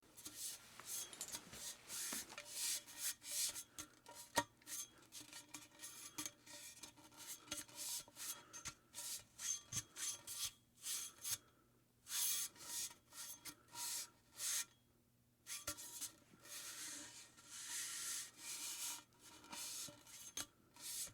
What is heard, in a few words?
fuel rod handle